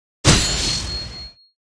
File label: JUMP ATTACK